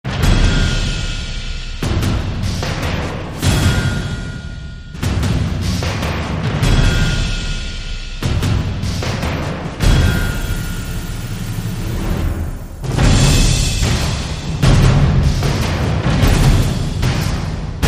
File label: Drums